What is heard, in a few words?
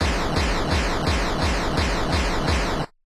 boss die